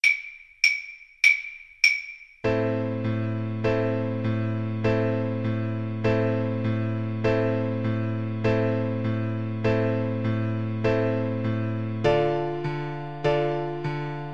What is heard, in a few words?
Guitar